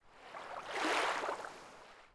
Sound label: Oasis Wave; Ambient; Civ4 warlords